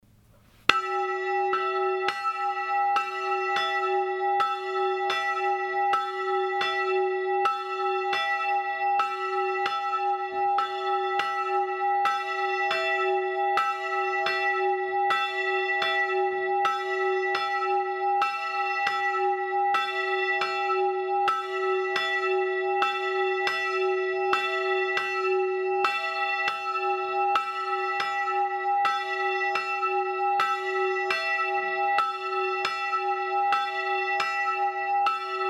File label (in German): Zumberk grosse Glocke